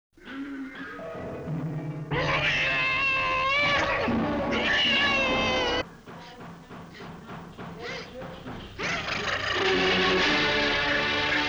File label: Etude for Pianoforte